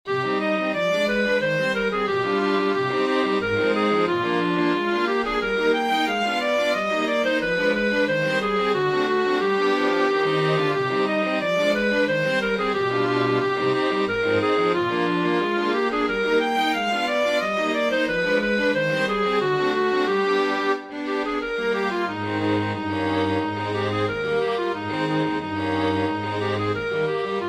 clarinet strings; mendelssohn bartholdy felix; lost happiness; lieder ohne worte

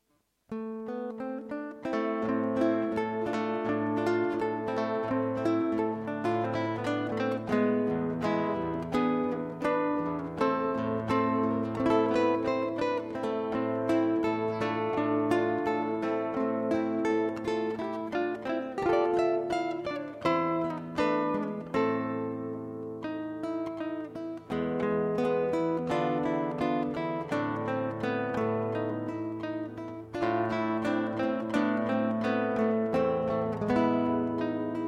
andante duo; carulli opus 241 part one

